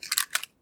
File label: gun; ドラマや映画でよく拳銃を構える時に鳴る「カチャ」という効果音素材です。